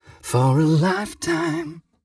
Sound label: m 129 mel e, Vocals